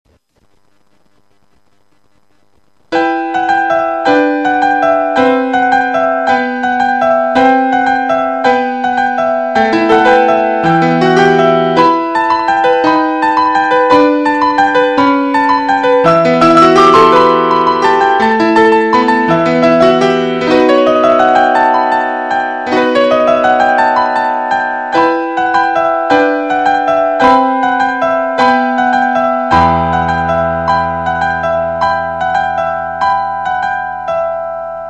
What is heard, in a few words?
Christmas Piano Carol Of The Bells